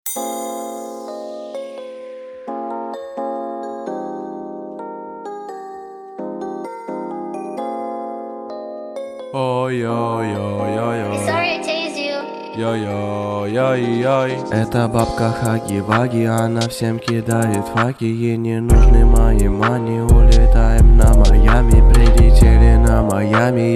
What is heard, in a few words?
slowed reverb; Хаги Ваги